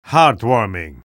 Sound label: spy cheers; vo